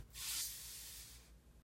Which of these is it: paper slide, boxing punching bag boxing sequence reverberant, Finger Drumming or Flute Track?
paper slide